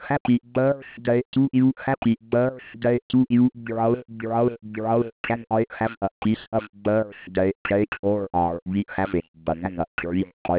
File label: Happy Birthday, voice